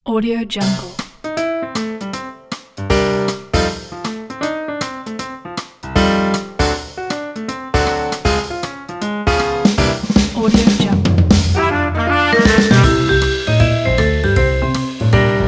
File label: swing happy jazz